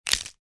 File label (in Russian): zvuk pereloma palca